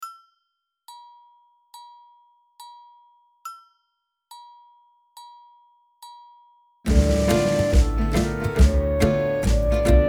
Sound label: Oriental Thing